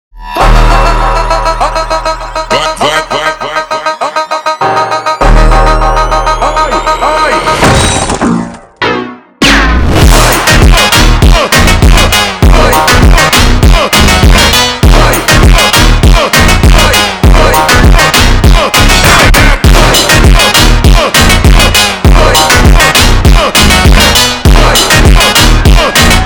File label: Funk slowed